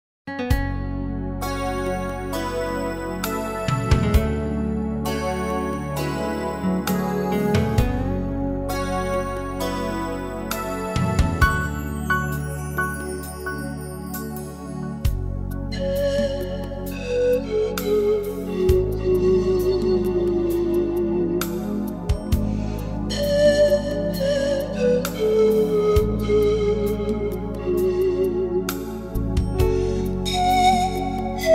одинокой флейты адажио, lonely flute adajio; сказки одинокой флейты сказка 16

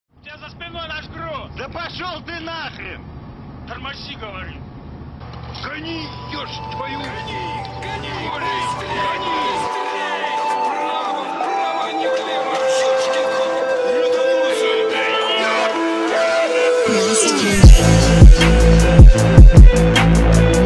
фонк racing phonk

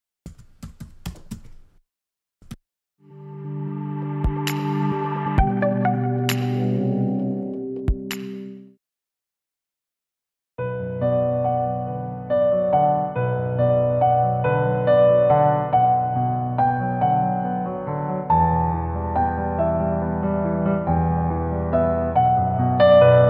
вальс караоке piano karaoke; м ф анастасия

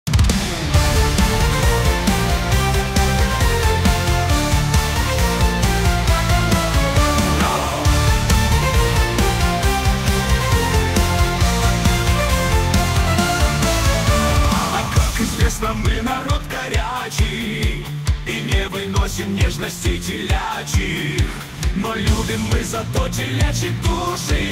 pirate metal песня; бременские музыканты

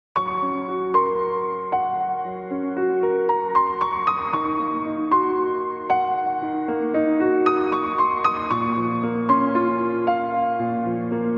караоке piano karaoke

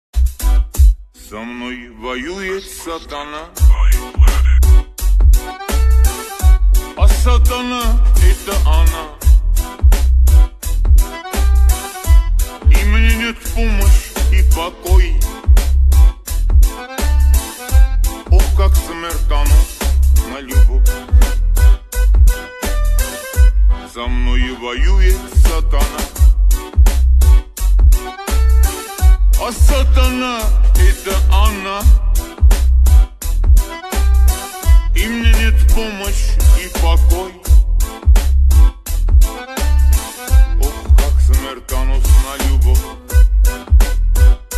ремикс bass boosted and slow remix

